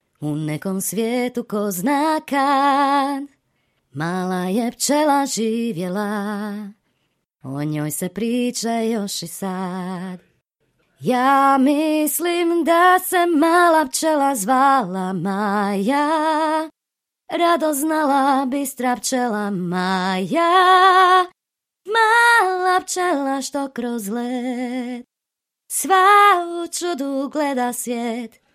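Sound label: Croatian, female, singing; voice over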